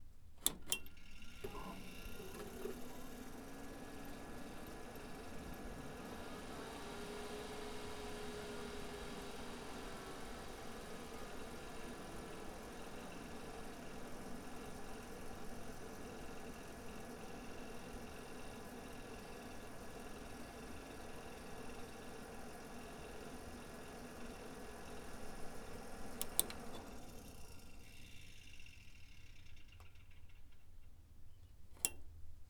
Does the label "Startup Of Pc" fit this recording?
Yes